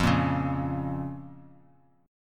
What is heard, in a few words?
F Suspended 4th Sharp 5th F; strummed; x,8,8,6; JGuitar